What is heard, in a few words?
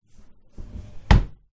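Dresser Drawer Shut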